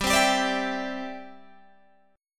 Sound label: C-Major Add 9th G, Guitar Half Step Down